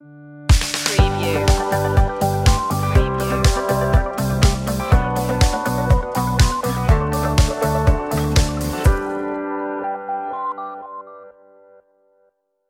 hopeful stinger

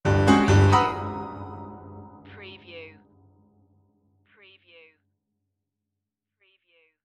Piano horror stab; beanstalk audio

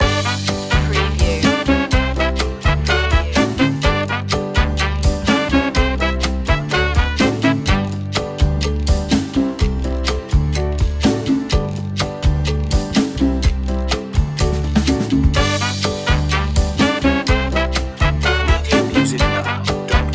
latin fiesta on the wall loop

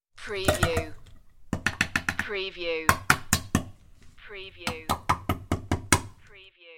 Empty Can

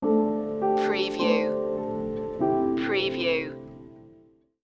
snow flakes stinger

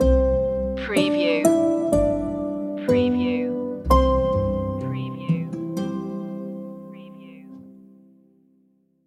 sleepy lullaby stinger

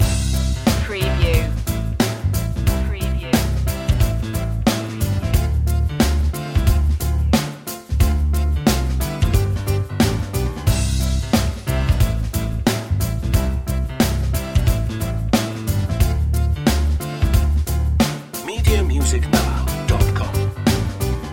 walk happy loop

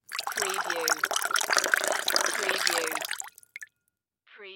Wine Glass Fill; Sound Effects Bible

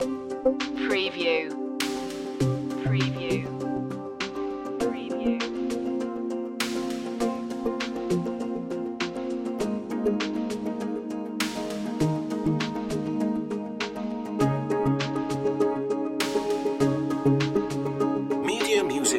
ad style loop

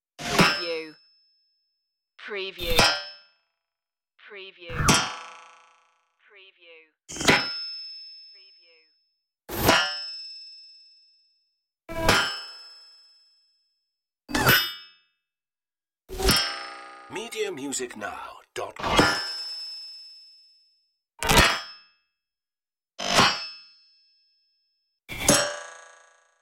laser metal impacts

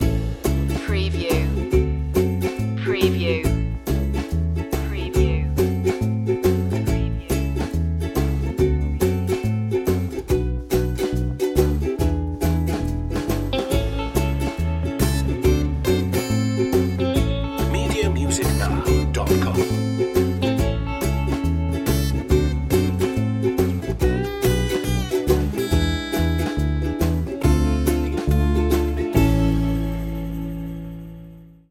joyful ukulele

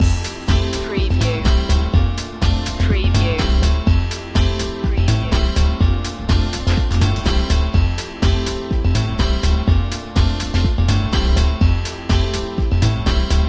vintage beats loop

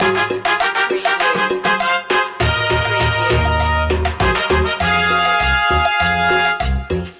colombia cumbia loop

